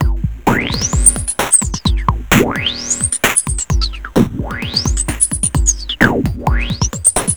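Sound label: Messup Loop, beats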